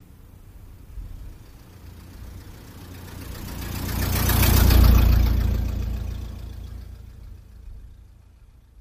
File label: Car Slw Pass By Right L